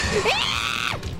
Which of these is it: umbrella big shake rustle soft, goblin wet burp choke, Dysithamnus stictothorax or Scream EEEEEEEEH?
Scream EEEEEEEEH